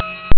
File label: ding